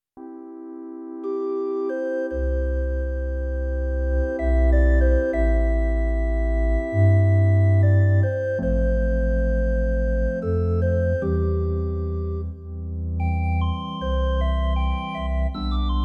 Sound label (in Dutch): Kerkorgel